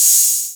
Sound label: Percs